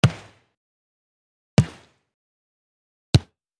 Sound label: Football ball kick